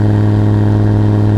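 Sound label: engine exhale, advanced engine sounds; engine2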